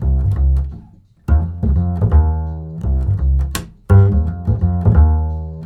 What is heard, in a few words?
acid C, 85 acid swing, bass; Spectrasonics; Bass Legends